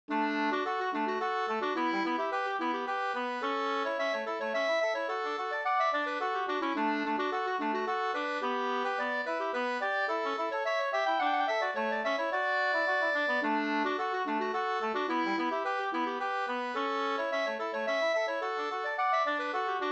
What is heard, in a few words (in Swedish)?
polska Bingsjopolska Polska